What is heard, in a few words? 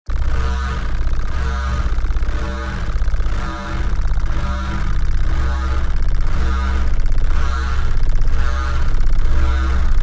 Alien Com